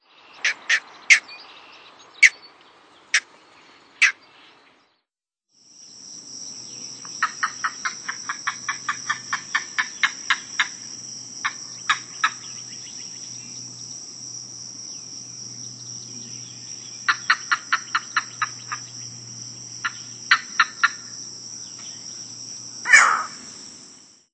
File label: Green Heron; Birds